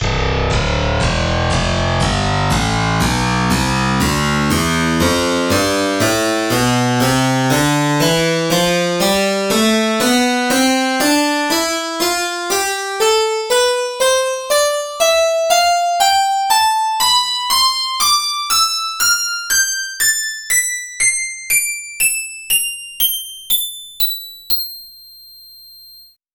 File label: harpsichord tonleiter; SpectMorph Evaluation #4